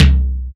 Tom SP; Drums

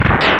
Explosion

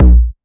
Tom Crushed; Drums

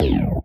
FX Zap Drop Vocoder; FX Hit; Drums; Ableton Live 12 Lite; Core Library

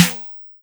Snare; Ableton Live 12 Lite Core Library

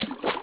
water; graal2001

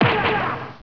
gunshot; Game Maker4

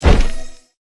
push pullable pickup; puzzle

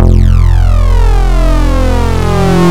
Vector Sprite Complex Octa Phase; Ableton Live 12 Lite; Sprites

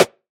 Snare DnB, drums; One Shots; GrainUrs

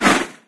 whoosh; There; ThereClient; bacio

